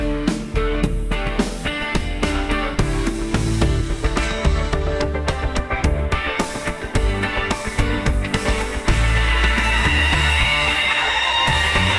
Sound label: loop guitar solo bossa nova C minor bpm 100